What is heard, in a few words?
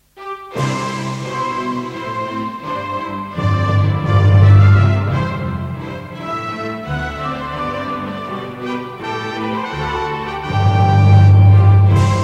country house theme; Recruiting Officer